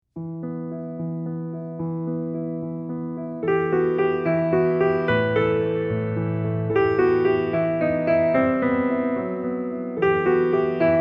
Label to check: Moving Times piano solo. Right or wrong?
Right